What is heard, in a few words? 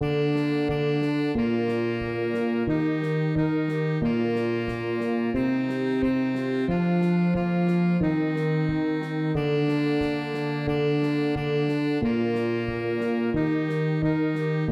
sax bar